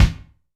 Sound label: BD, BASS DRUMS; Drum Mashines; BASS DRUMS 2; EdgeSounds